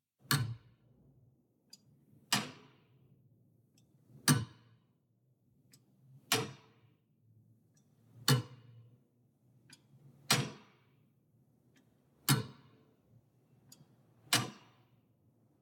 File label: clocktower tick loop